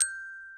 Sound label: gloken